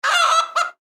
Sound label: Animal Chicken Cluck; Chickens; Animals